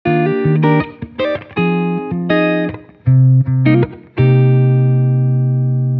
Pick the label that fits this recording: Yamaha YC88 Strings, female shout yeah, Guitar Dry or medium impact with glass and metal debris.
Guitar Dry